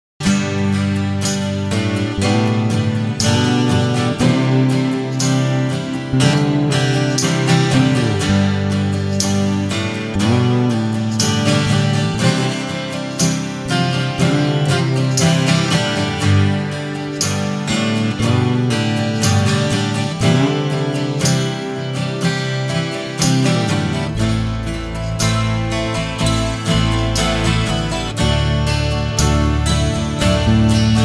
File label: 12 String Test